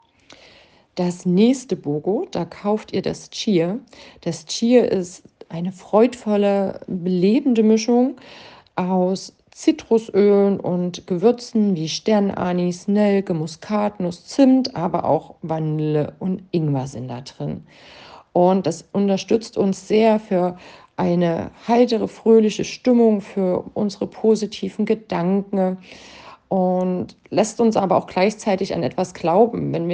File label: Cheer